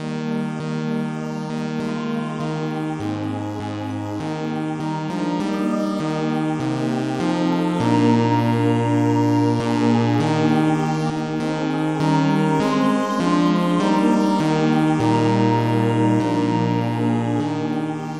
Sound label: polyinstru; hymns; Himnario grande